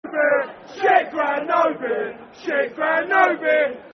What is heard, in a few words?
Football Chant (Soccer Song); No Beer; Shit Ground No Beer; Millwall Mfc